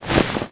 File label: run foley stop; gamerip